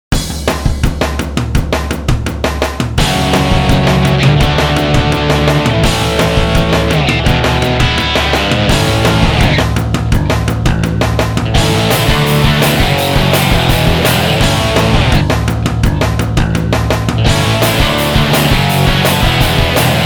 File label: punk drive